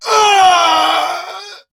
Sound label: Death; Warframe; Dax Ashigaru; Battle Barks